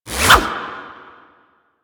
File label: Baton Whoosh; Warframe; Lasrian; Lasrian Soldiers; Weapons